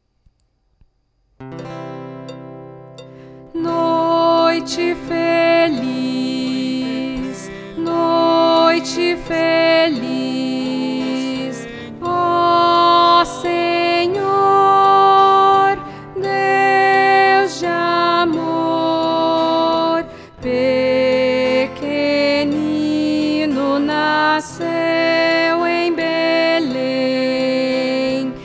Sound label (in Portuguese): contralto